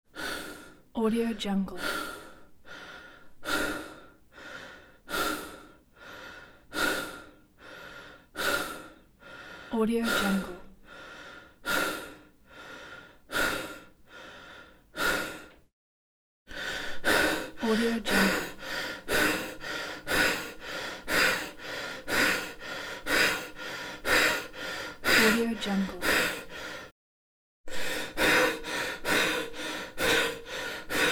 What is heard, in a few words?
male heavy breathing